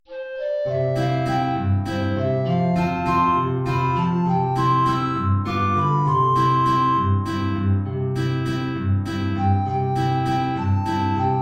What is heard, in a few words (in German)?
Altflöte & Gitarre C-Dur; tiefe Notierung